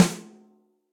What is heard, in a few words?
Snare Hit Deep Snare Dynamics; Drum Hits; Standard Hits; Ableton; Factory Packs; Session Drums Club